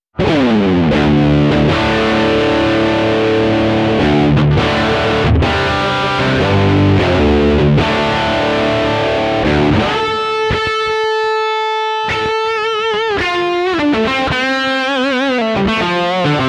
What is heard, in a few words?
blues; vox